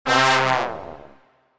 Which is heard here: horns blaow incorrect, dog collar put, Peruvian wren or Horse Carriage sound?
horns blaow incorrect